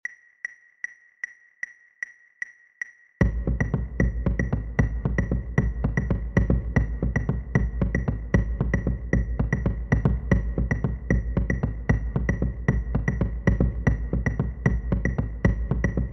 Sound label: sequence flam bass